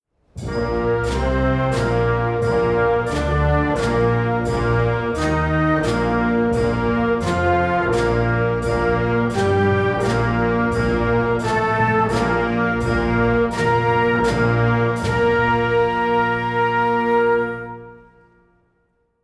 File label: Exercise - Intervals